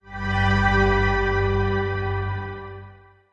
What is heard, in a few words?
sting box appear; ida appear